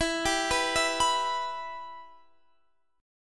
E-Minor, Guitar, Standard; arpeggiated; JGuitar

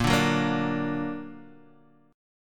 D Augmented A sharp, guitar

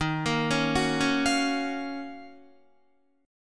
Eb Minor 9th Eb Guitar; Ebm9; arpeggiated; JGuitar

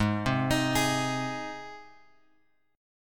G sharp Major G sharp, chord; G# Chord {4 3 X X 4 4} arpeggiated

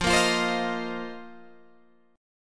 F 7th Suspended 4th F down Guitar Open G

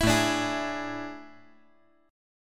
A Major 7th Suspended 4th; chord

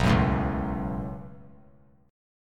B 7th Suspended 2nd; B7sus2; strummed; JGuitar

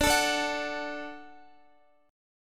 D Major D down Guitar; strummed; tuning 4E,4A,5D,5G,5C,6F

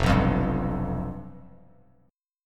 F Suspended 2nd Flat 5th, down

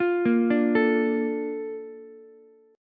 A sharp Major 7th, Ukulele; A#M7; arpeggiated; JGuitar

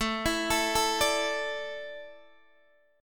A Suspended 4th, down, Guitar, DADGAD

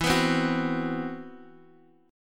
C Major 7th Flat 5th E

